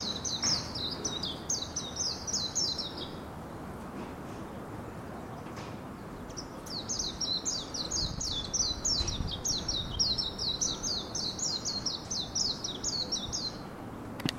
mejiro

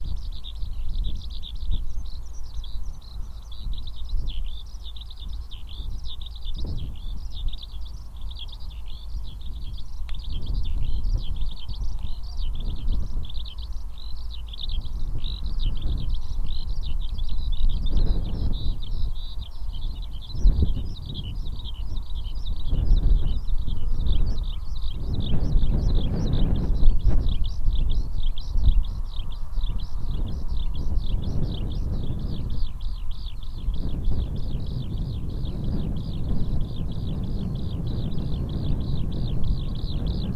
hibari song

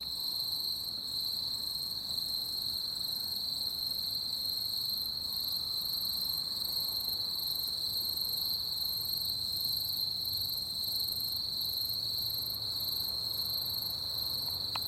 aomatumusi

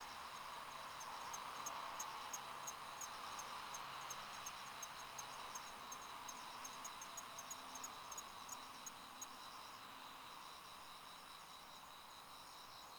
kanetataki

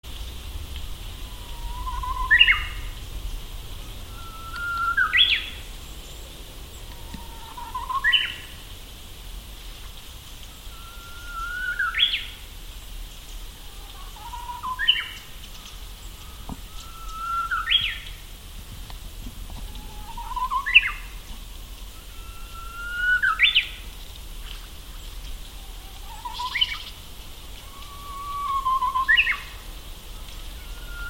bird uguisu; akasaka

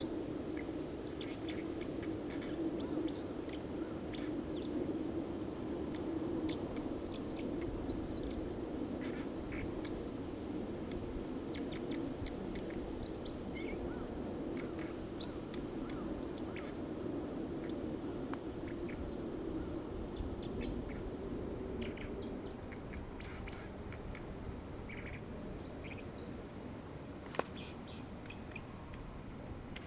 bird mozu nakimane

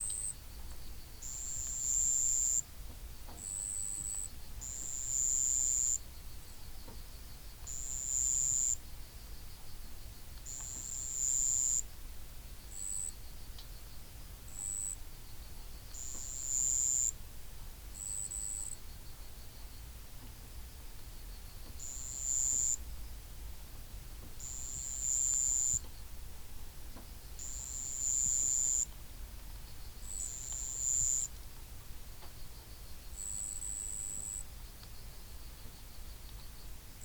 sibasuzu

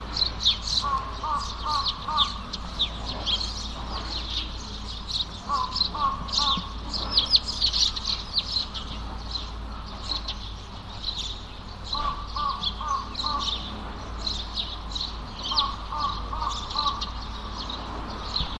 bird suzume

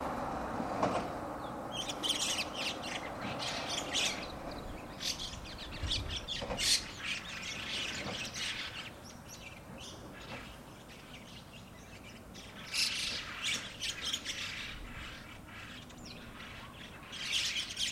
bird mukudori

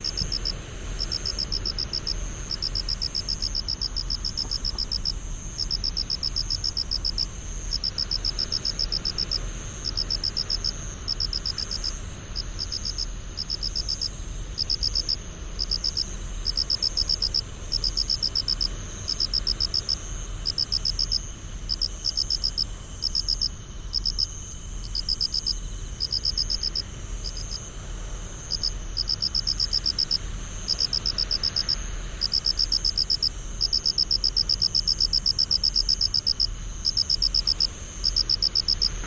haraokamekoorogi

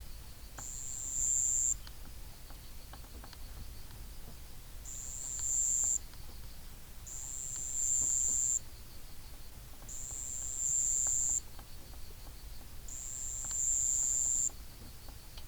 sibasuzu

